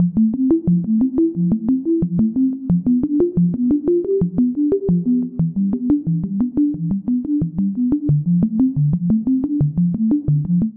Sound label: Glass Chimes A Minor 89 bpm; Accompaniment; Tonal; MIDI Clips; Drive and Glow; Ableton; Factory Packs